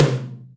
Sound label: Rock, Acoustic Tom